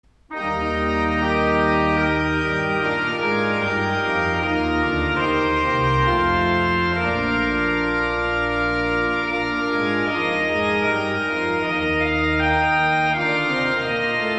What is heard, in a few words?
Organ; Johann Sebastian Bach; Wachet auf; St. John's Cathedral, Jacksonville Florida